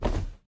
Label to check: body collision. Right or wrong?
Right